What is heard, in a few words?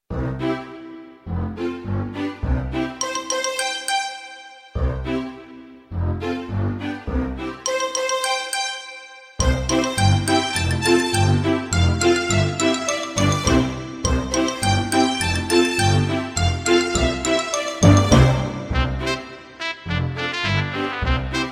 twinkle twinkle little star instr